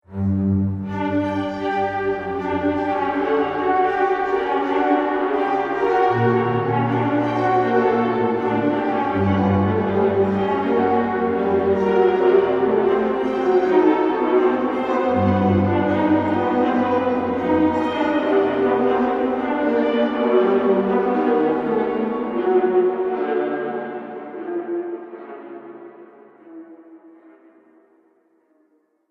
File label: The Ghostly Orchestra; Ghost Sound Effects; Andrew Fleming